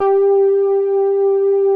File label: MUTED PADS; Z1 PADS; Pro-Rec - Synthsonic